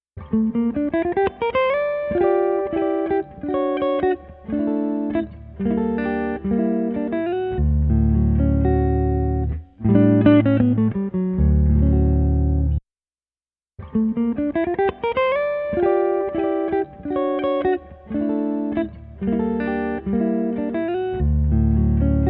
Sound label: electric guitar test